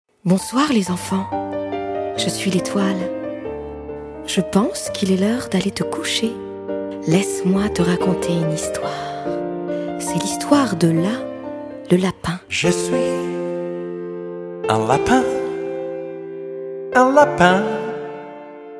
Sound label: lalala